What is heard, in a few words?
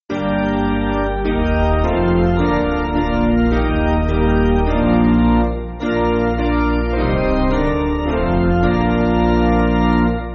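Piano, Music Backing; Basic Piano & Organ (CM)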